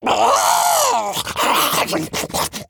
Male Murloc Attack

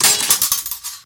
Death Underlay

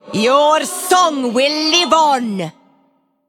Female Emote Death Growl

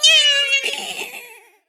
Female Beast Death

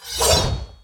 Armorsmith Underlay Attack; Alley

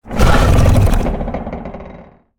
Statue Corrupted Attack

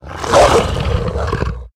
Dire Frostwolf

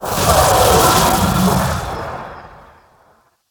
Mana Cyclone Death

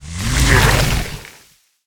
Ironbark Protector Attack

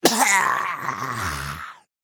Giant Rat Death

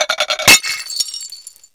Cup Death

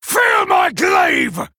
Male Night Elf Attack; Kurtrus; Cariel Mission6

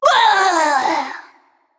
Female Naga Death

